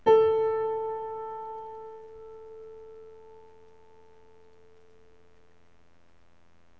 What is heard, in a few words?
piano